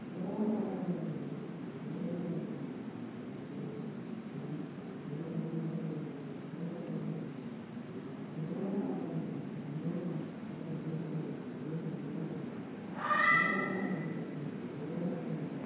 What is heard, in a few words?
Wind inside